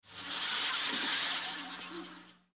risas baja calidad

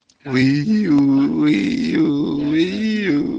ambulancia

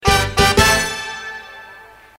trompeta; cucardas

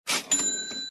registradora

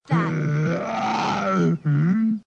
grunido hmm

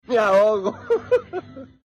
me ahogo risas

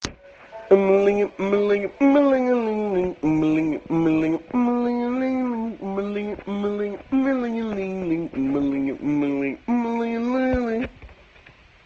guitarra humana

